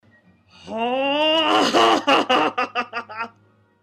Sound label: girl laughing